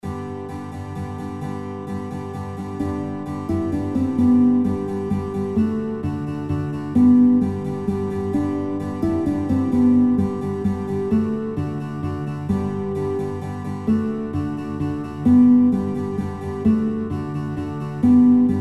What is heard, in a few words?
Guitar; Guitar Songbook; O Du Lieber Augustin; kinderlieder gitarre playalongs